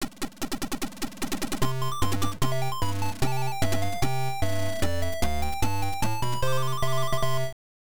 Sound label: Winning Dance; penguin adventure msx